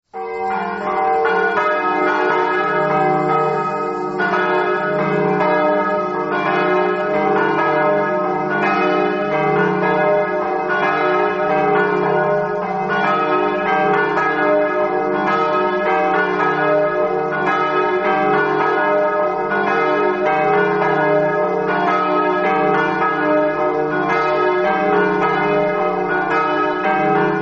manzano, campane

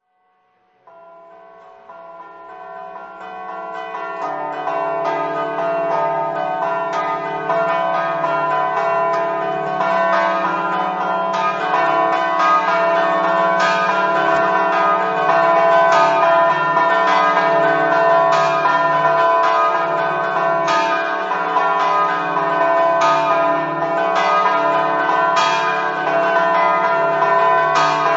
carpacco, campane

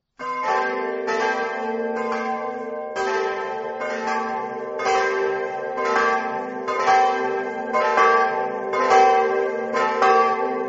vacile, campane

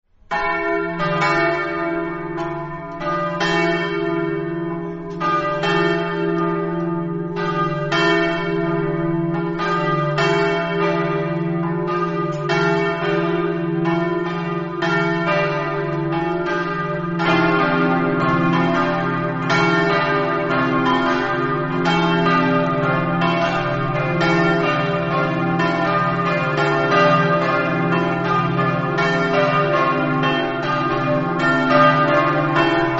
s maria, campane

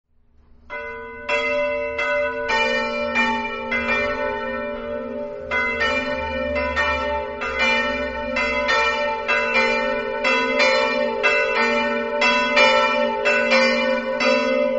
branco, campane

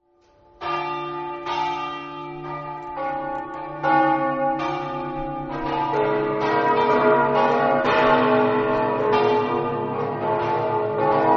tricesimo, campane

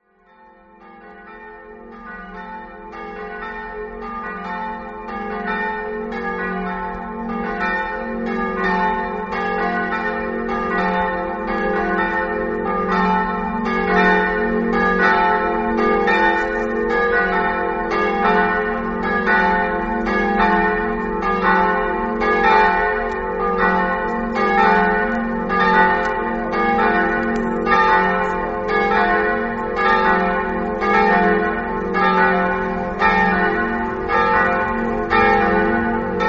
udine, campane

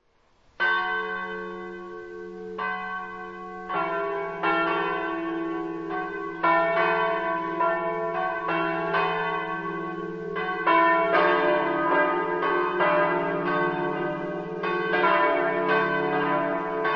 corno, campane

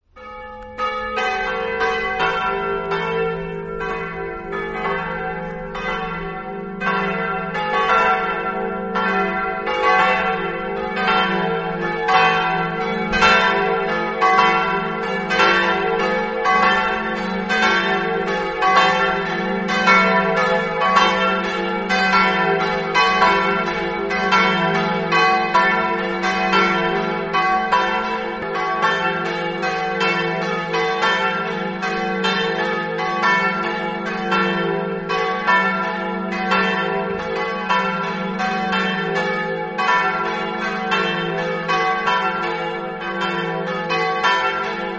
ipplis, campane

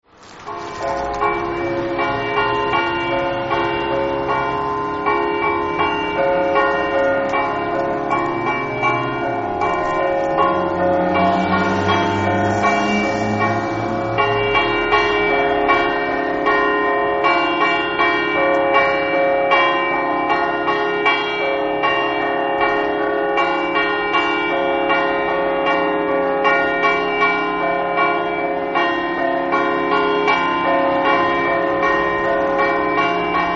lucinis, campane

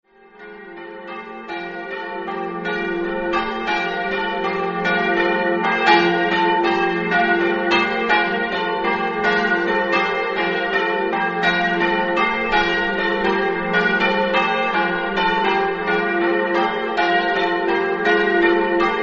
valle, campane

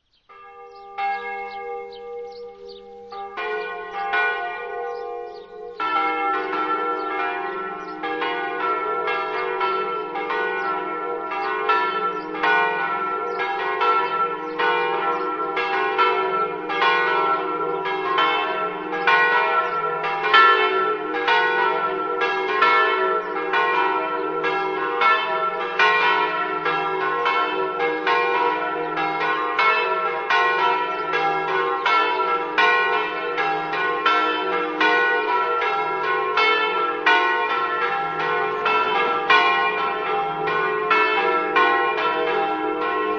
lauzzana, campane

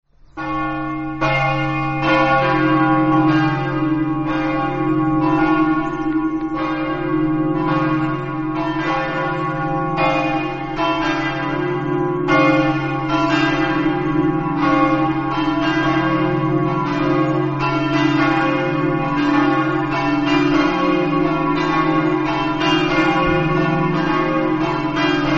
meduno, campane

